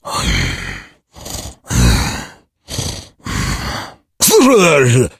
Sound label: zombie idle